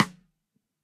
SNARE side stick

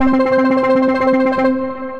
Past Arp, Synth Rhythmic

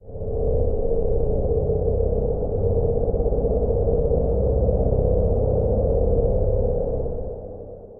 Evolving Bass; Anomalocaris; Ableton; Drone Lab

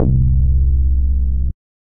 Snappy Syncro Bass, synth bass; Ableton; Factory Packs; Singularities

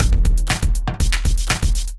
Kindified Kit, Drums; Kindified Kits

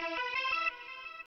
175 D&B Synth Abm; Dance DJ

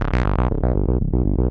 Storm 303, Analog Bass; Ableton; Factory Packs; Retro Synths; Analog Bass Vol 1

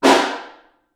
Far, Snare

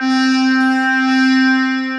Pad; Eager Pad; Ableton; Retro Synths; Vector; Vector Patches

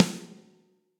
Snare Hit & Rim Studio Basic; Drum Hits; Ableton; Factory Packs; Session Drums Studio; MPE Hits

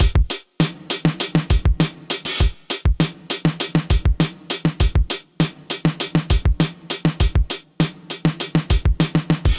100 Groove, Drum Machines; Trax Deeptrax; Ableton

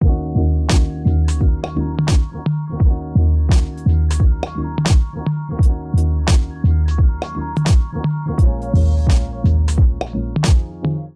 Groove 86bpm, Drums Complex; MIDI Clips; Moonlight; Ableton; Chop and Swing

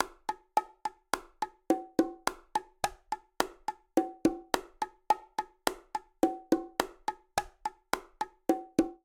Bolero melodico Bongo-106bpm; Latin Percussion; Ableton; Factory Packs

